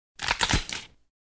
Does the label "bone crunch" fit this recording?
Yes